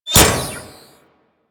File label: Kunai Impact Default; Warframe; Hit Effects